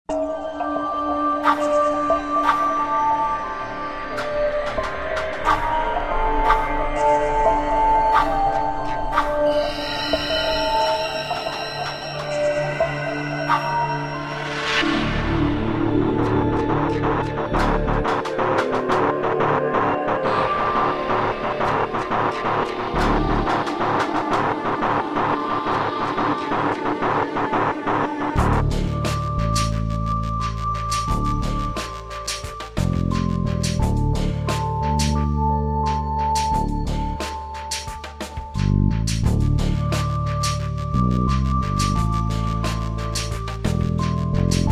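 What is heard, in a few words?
criminal music without words for background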